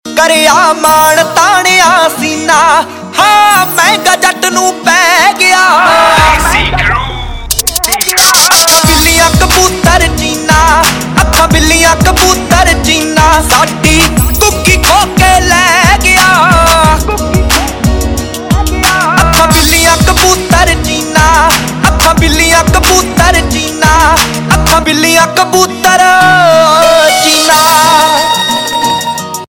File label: Ringtone, Punjabi